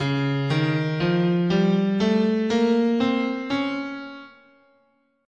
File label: C sharp Lydian Augmented, scale